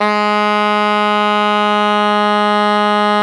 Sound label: SOP FF G#2, Saxophones; Greytsounds; Philip Wolfe David Crozier's Saxophones